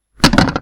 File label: Impact